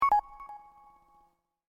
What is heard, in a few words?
message send; chat